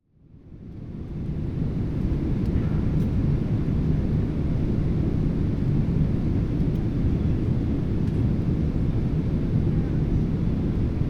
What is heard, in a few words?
boeing 777 ambience unfiltered; airplane inflight audio recordings